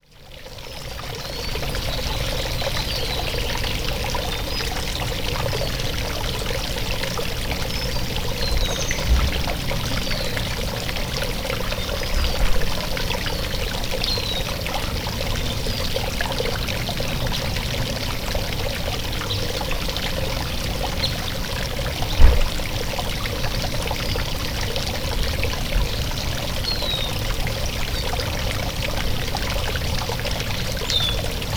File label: backyard